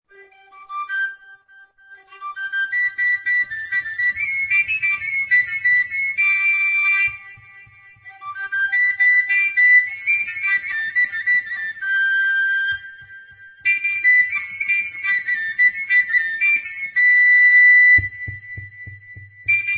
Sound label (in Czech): Koncovka G